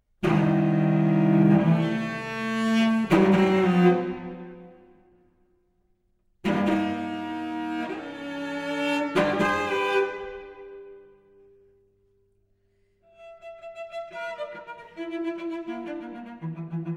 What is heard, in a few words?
Cello Solo - Lento maestoso; Alisa Weilerstein Solo; Decca 2014; Tema 'Sacher' for Cello Solo